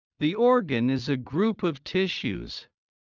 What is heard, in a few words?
Heart low; Classroom humanbody